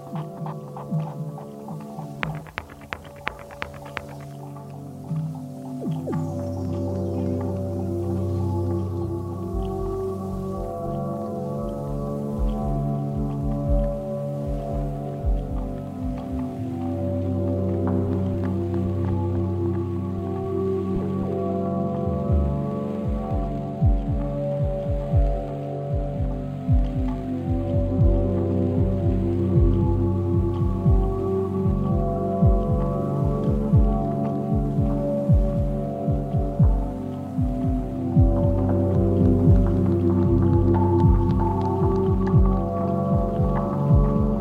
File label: ambient version